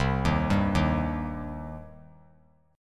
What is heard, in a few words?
C Minor C down; arpeggiated; JGuitar